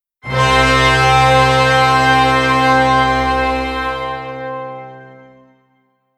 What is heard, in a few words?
ff longtone